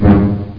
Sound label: EXPLOS; PCMania; biobomb